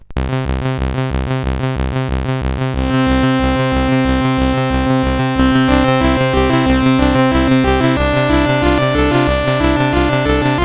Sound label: sid, CSG8580R5; FlaschBier